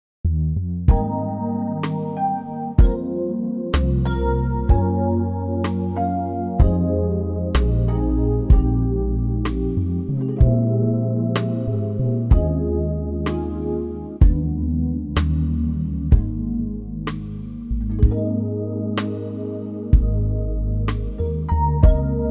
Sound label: Ab smooth; key - Ab - vocal range - Bb to Db; Smooth sounds and cool chord changes